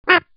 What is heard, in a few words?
pup bark